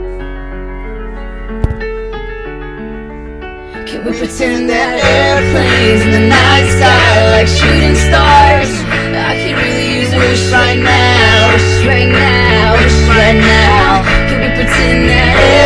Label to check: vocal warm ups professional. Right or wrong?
Right